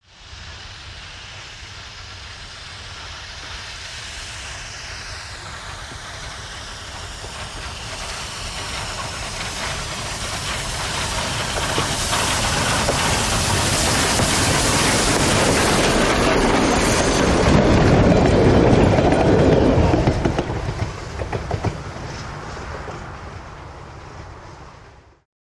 Steam Train By Big Steam

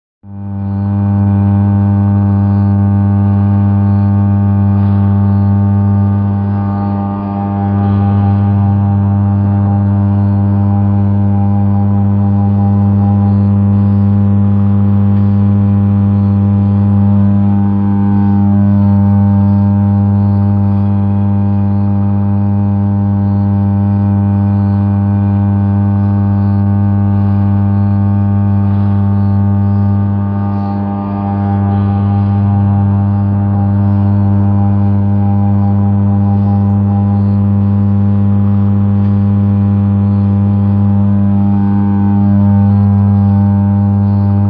Electric Motor Deep

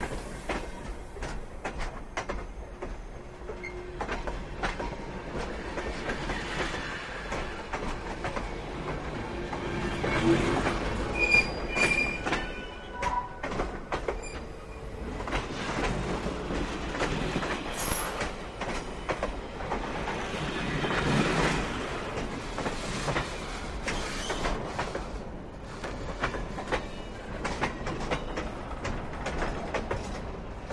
Freight Cars Roll By, Slow And Detailed

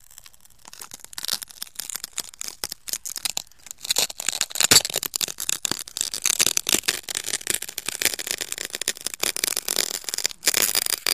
Glass Grinds Creaks; windows & mirrors

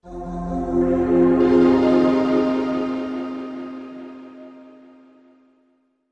Deep String Low Voice, stinger

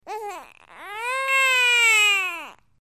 Baby Whines Cries Bre

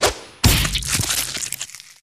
Beheading Sword, whooshes & swishes

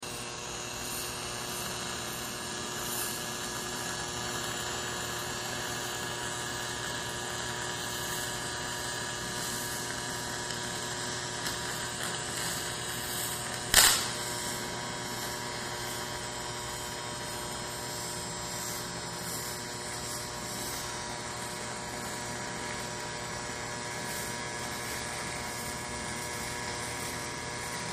Welding Various

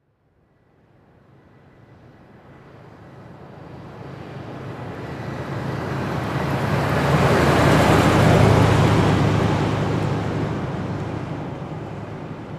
Expedition Slow By, ford expedition

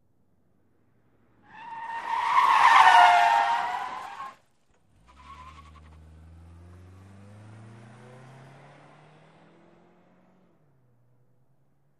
Sedan 180 Skid Mix

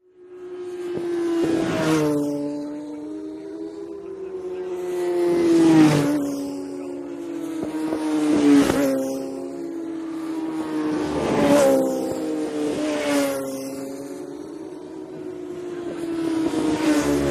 Motorcycle Road Racing

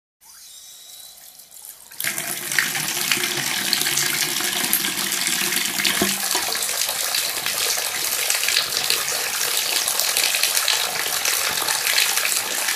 Bathtub Fill Water

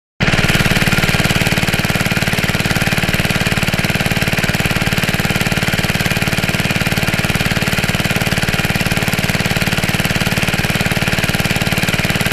Water Pump Two Stroke, pumps

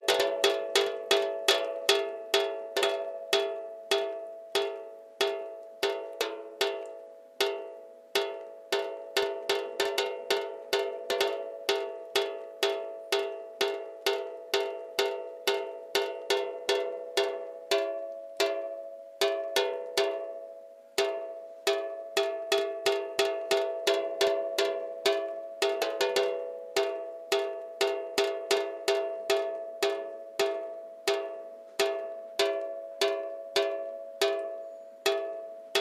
Water Drops A Metal, drips

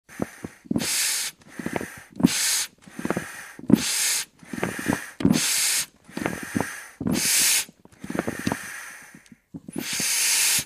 Bellows Small Rubber, air

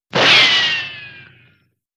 Ricochet, bullet bys & riccos

